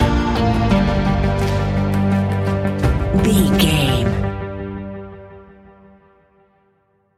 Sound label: daunting rising tension stinger; Daunting Rising Suspense Stinger; Catch 22 Music Network